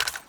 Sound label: mag out, ak74; cof